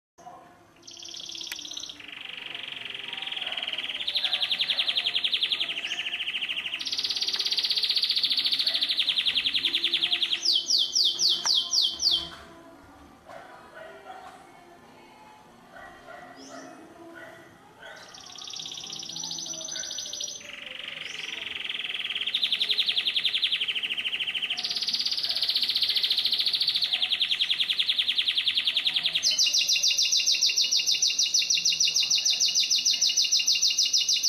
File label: Canary, Bird Songs